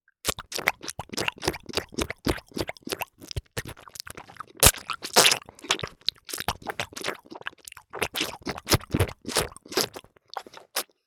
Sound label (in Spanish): Pasos Rapidos Liquido Viscoso